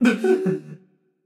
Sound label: breath, voicepack; smite; jorm